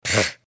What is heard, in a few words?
pfth; pony; Twilight Sparkle